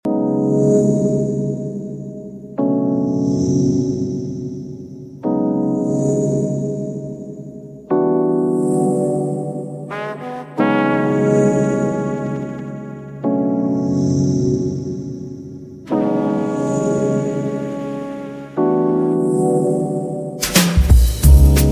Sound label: Lofi Neo Soul